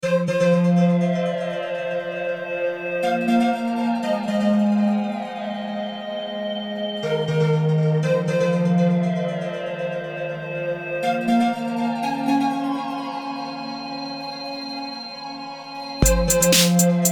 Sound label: Russian Psychedelic Music